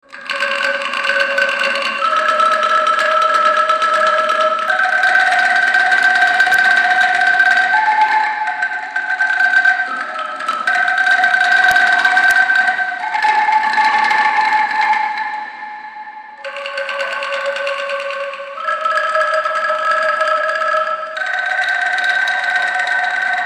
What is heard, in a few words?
angklung impro; indonesia